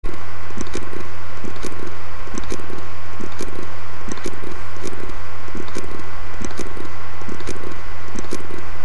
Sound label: samsung bad sectors